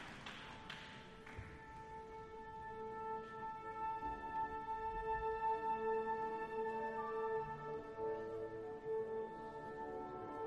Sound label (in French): musique contemporaine